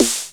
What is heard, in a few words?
snare, Disco House